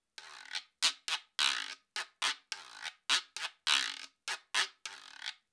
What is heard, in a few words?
Guiro